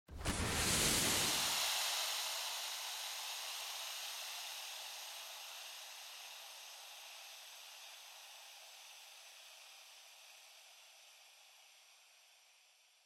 smoke emit

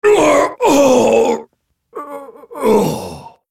dying; Volibear

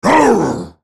spellcast effort; Volibear

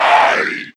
pain; bioclone

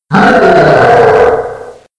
bowser jump; freak fortress 2

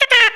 jump; isabelle

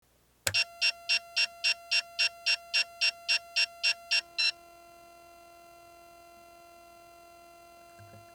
Targeting Lock On; hl2dm

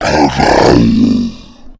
alert; agrunt

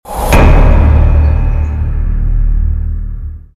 stinger; tf; slender; prisoner

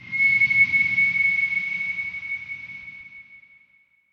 whistle; axel nosferatu

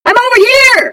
jump; freak fortress 2; claptrap

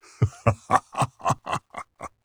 laugh; garrosh

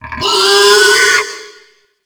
wretch tunnels sight; A Machine for Pigs

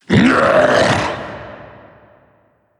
meph attack; slender

